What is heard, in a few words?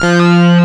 AN SYN, SYN & LEAD; SAMPLE WAVE MIXING 2